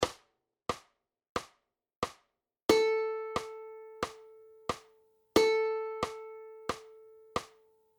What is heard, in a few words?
Whole Notes; ukulele; understanding rhythmic notation